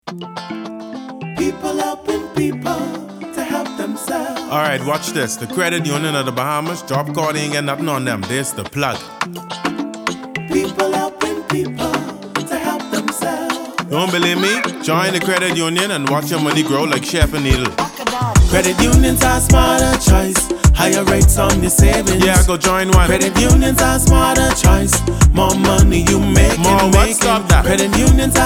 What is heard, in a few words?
Credit Union Jingle Solo